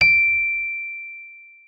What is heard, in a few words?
MK1F D7, RHODES; RHODES A; Xsample VOL-1